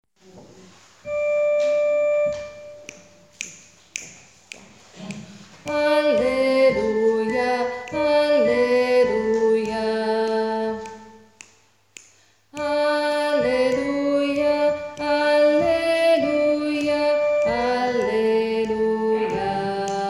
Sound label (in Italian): alleluia tenori; hassler